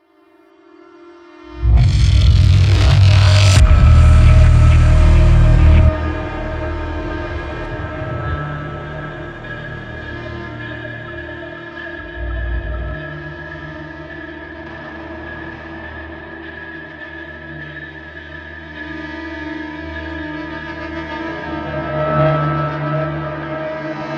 Combat Suite Main Ambient; Super Gore Nest

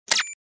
Button Proceed; Angry Birds Space

